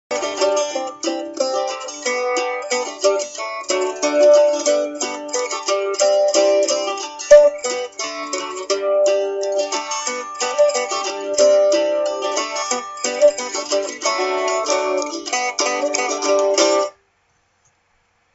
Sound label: Instrumental